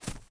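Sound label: grass run right, footsteps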